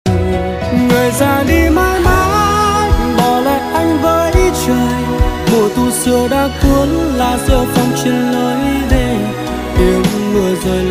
Nhạc Chuông

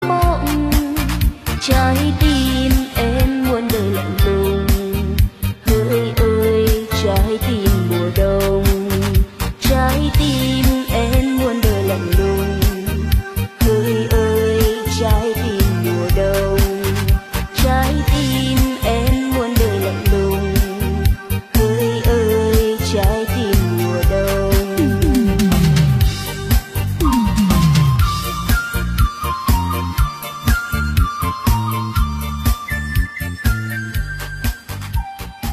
Lk Nhạc Sống Trữ Tình

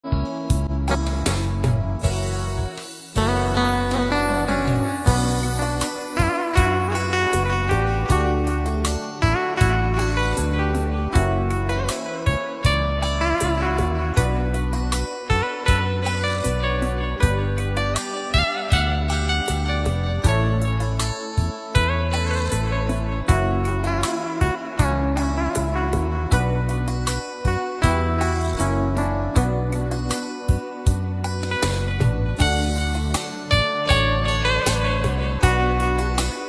Hòa Tấu Guitar Rumba Nhạc Không Lời Bolero

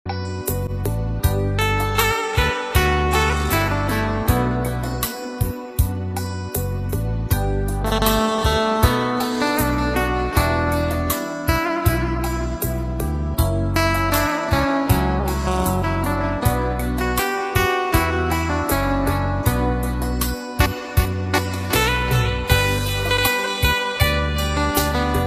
Guitar Phòng Trà Không Lời Liên Khúc Hòa Tấu Rumba Bolero

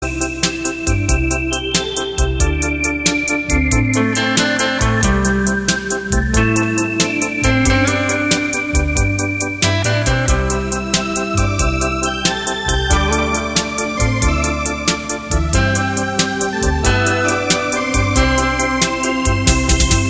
Hòa Tấu

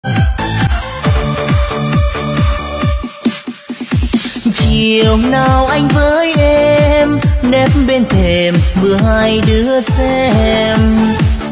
Nhạc Sống Nonstop Dj Remix Bass Căng Đét

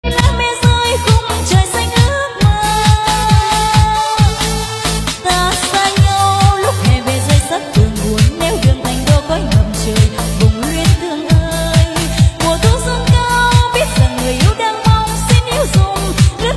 Nhạc Sống Remix Dj

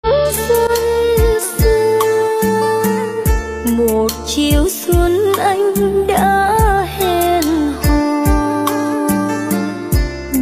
LK Nhạc Tết Rumba Nhạc Xuân Trữ Tình

